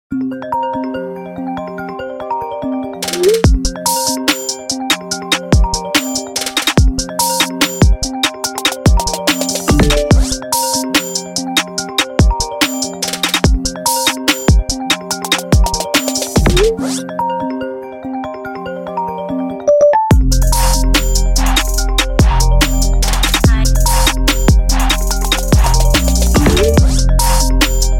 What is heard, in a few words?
iphone x marimba trap remix ringtone, apple